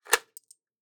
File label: Ejection Bullet